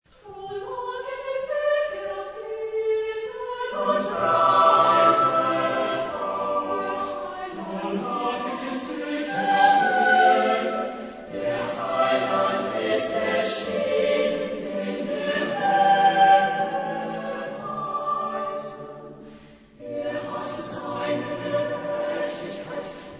Anthems, chorus; Mendelssohn; 6 Anthems Op79; Frohlocket

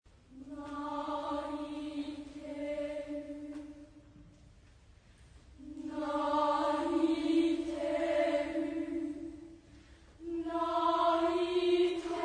chorus; Norainu Doji; Naiteru

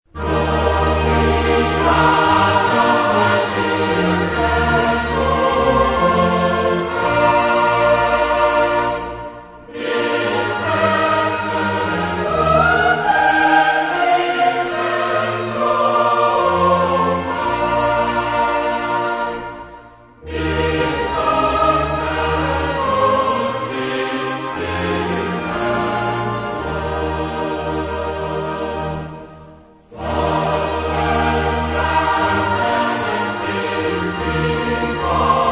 Cantata, chorus; Bach; BWV140; Gloria sei dir gesungen

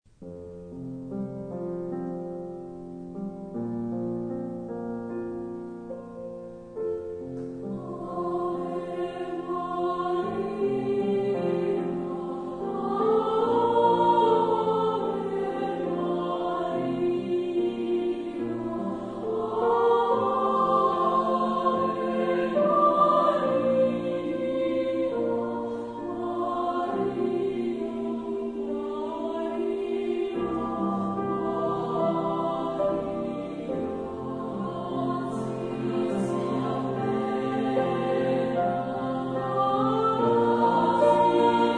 Ave Maria in F, female, Pf; chorus

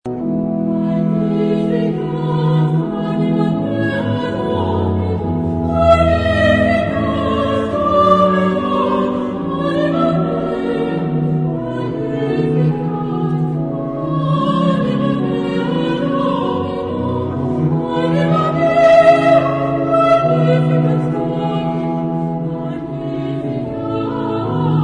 Magnificat (female), chorus; Charpentier

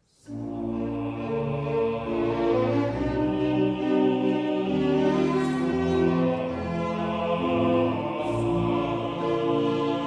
Missa brevis in Bflat major Sanctus; Mozart; chorus

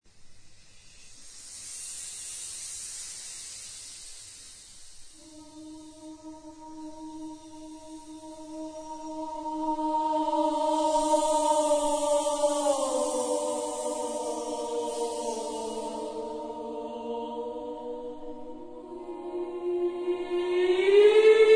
female; chorus

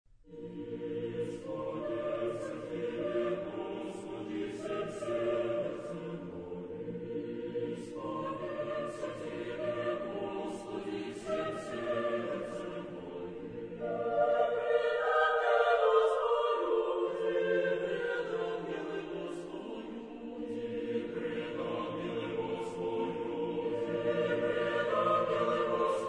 double choir; Bortnyansky; Sacred Concerto for double choir No1; I will praise thee with my whole heart

